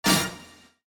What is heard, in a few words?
Slot machine casino reel spin symbol hit